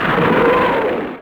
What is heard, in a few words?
katyusha; WAR